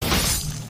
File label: slash